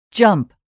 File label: jump